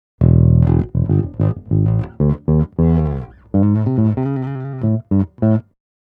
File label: Neck Finger, bass